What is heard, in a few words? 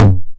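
909 high tom tape saturation; Toms; Goldbaby Tape808 & Tape909; The Tape 909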